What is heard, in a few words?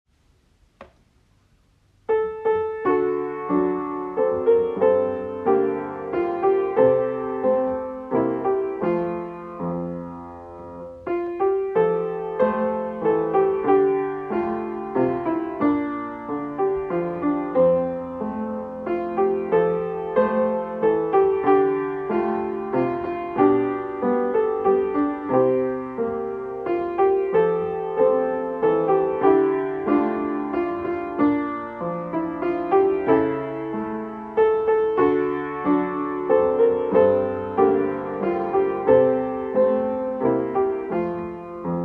Utah Pioneers accompaniment only